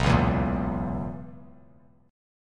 B Major 7th Suspended 2nd Suspended 4th B Bass Standard; BM7sus2sus4; strummed; JGuitar